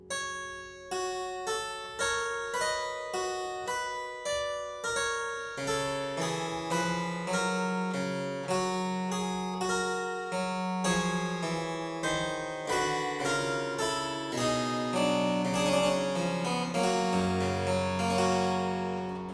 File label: Aria in G major Partita 23, Harpsichord